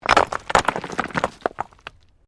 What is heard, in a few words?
STONES MOVE